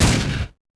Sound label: Helicopter Cannon End; Max Payne; penthouse